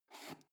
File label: foot switch dimmer slide down